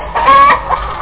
CHICKEN; OctaMED SoundStudio V1; animals

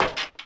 Glass Step, Footsteps; urbanchaos

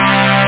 string; turrican-string3

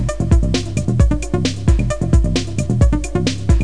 electro; Guitar&Electro

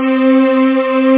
mix it string, strings; OctaMED SoundStudio V1

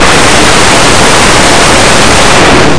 gear

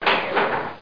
metal door; DR BRAIN; BUGS

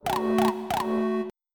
sniff

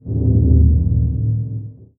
Low Hum

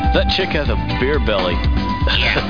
BEER BELY

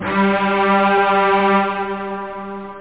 bowed stretch string; OctaMED SoundStudio V1; strings

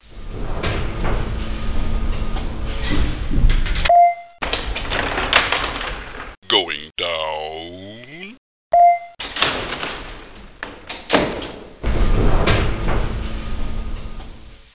ELEV; T.J. Finds a Friend

